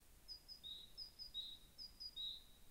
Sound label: bird